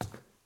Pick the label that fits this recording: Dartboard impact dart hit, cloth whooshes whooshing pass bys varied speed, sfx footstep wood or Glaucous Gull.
sfx footstep wood